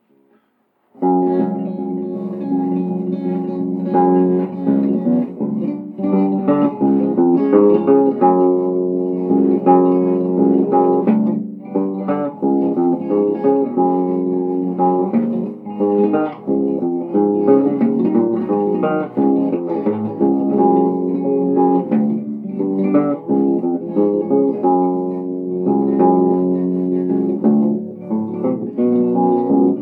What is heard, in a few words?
instrumental